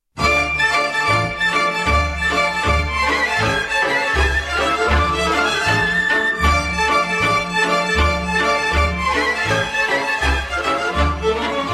Hora - Roumanie

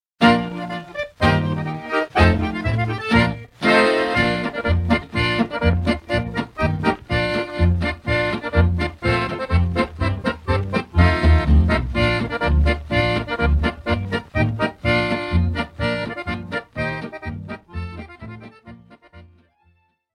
Double Quadrille, Danemark

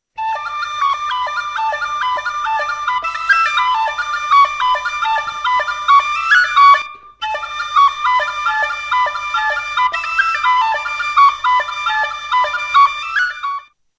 flute a dix sous; instruments

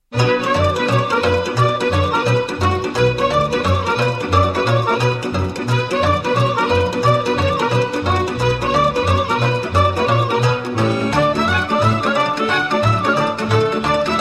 Sirba - Roumanie; Sirba Vanatorilor

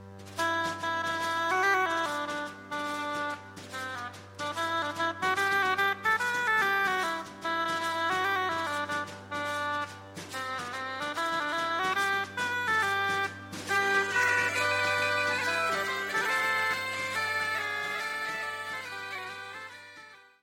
Scottish, France

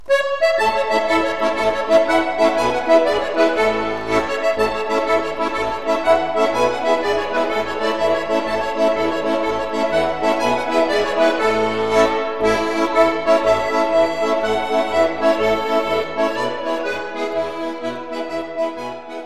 Quadrille; Belgique; Stavelot

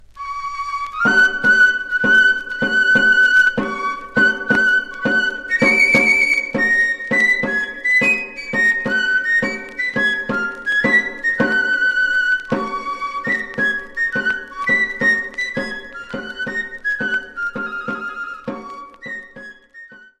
Makil Txikiak, Pays Basque

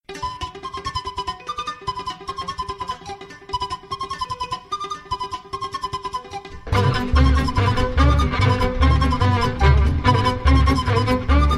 Roumanie; Vuvarul

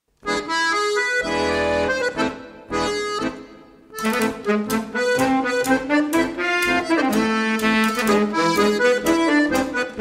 Tango, Belgique

